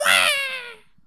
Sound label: player lose; GoldMinerJoe